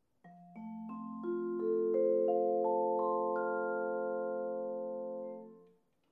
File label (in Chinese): Vic Firth M240 "中" 當代系列鐵琴槌 藤柄, 抒情且溫暖的音色; VIC-M240